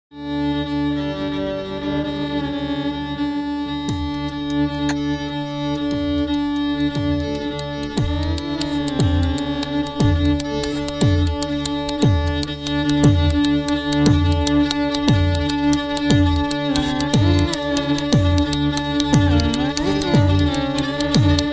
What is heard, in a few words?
Oriental Pitch